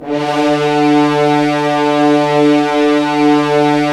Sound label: Horns; Roland - LA Composer vol. 4